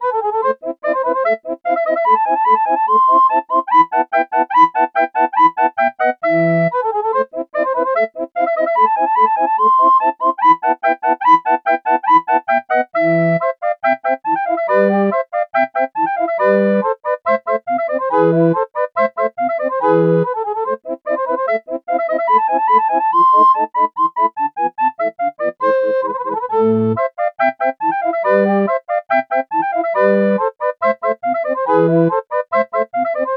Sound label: recorder